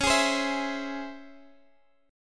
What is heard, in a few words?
C sharp Minor Major 7th, Guitar, Half Step Down